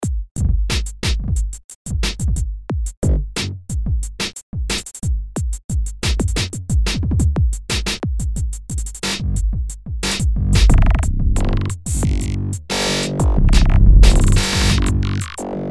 glitch

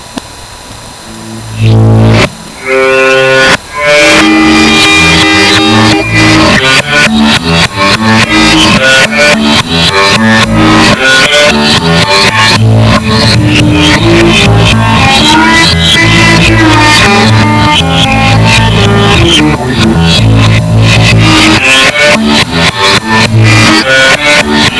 Nitrogenous Bass back

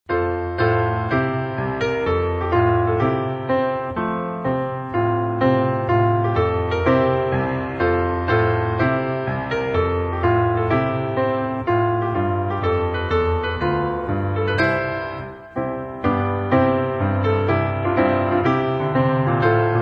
Trumpet Tune

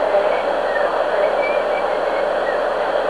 Base Whistle

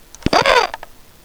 Woooo

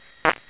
fart

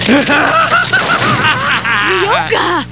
wahahaha; Ranma

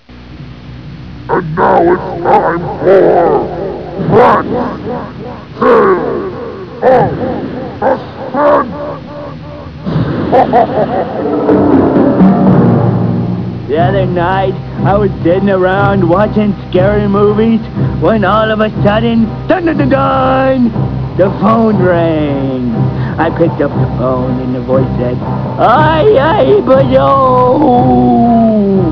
suspense

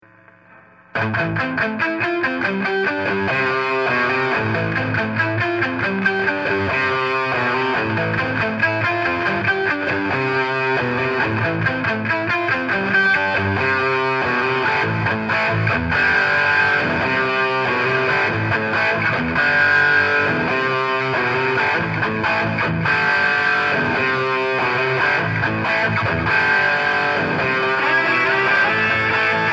jcm800

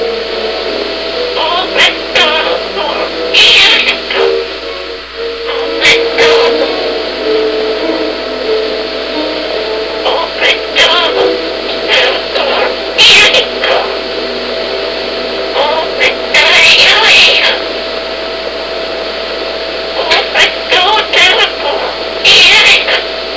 old macdonald

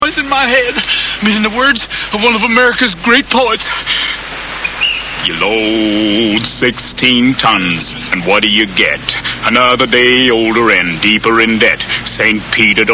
singing; ateam

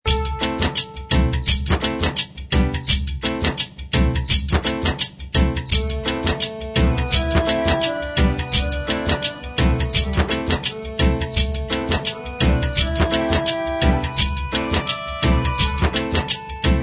Santoor

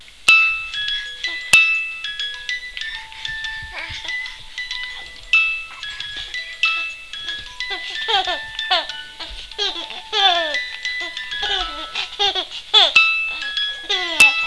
mollie music

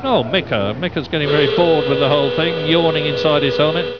yawn

